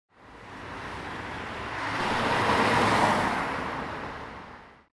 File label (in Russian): bystryj horoshij